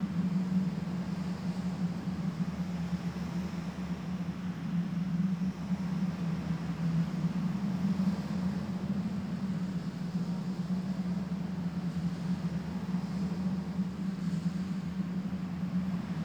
Under City Alley Bed Large, Ambience, Dungeons; Beds

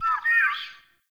Ext Close Bird, Ambience

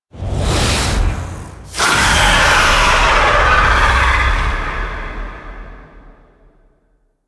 Vocal; Nira; Warframe; Quests; The New War; Narmer Outpost; Chamber Archon Intro

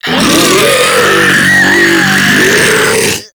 Infested Ancient Death; Enemies; Warframe; Puss Ancient

